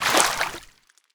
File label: water exit; player